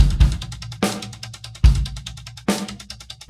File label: drum loop 4 bar 4-4, 145